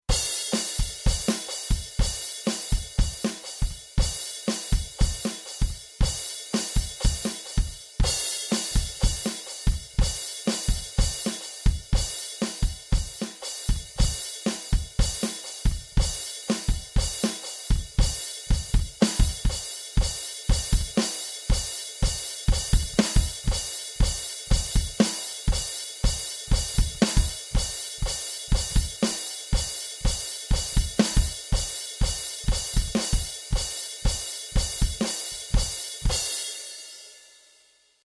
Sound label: halftime cover